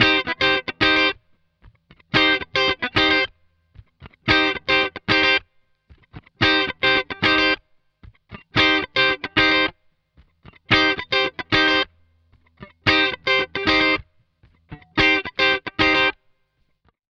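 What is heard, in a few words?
112 C SUGAR FUNK guitar, 112 BPM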